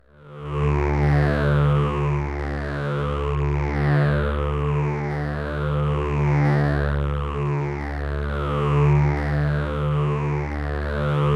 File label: Shepard filter droned